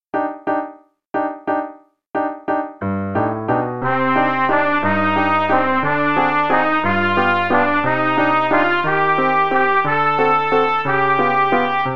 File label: (no click, dh=60) no dynamics; The Really Easy Trumpet Book